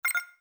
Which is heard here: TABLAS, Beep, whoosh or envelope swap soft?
Beep